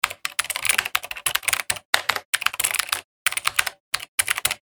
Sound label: computer keyboard input